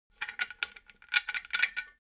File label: metal scaffold pole splint